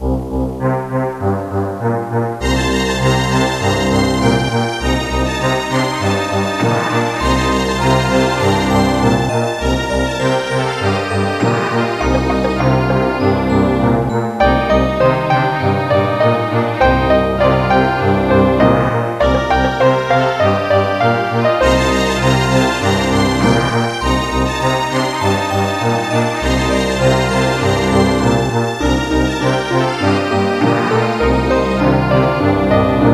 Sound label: jam